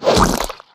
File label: Ink Miss; The Battle for Wesnoth